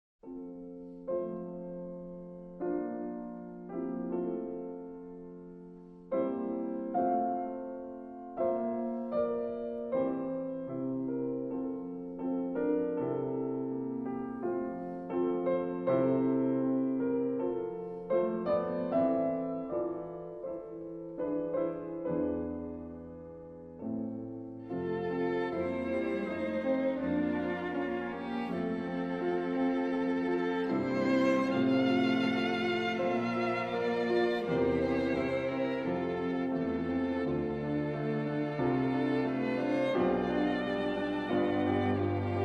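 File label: piano quartet; staehle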